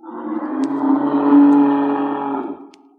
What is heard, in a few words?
monster; Animals